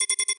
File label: win Count Up; eggomatic